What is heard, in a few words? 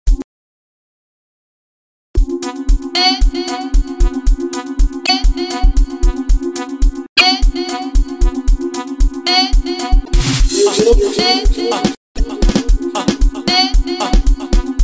Broken Piano Amapiano